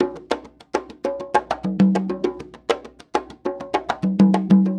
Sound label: Conga 100 bpm; Loops; Drums; Percussion; Ableton Live 12 Lite; Core Library